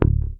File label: HOFNER MUTE, Bass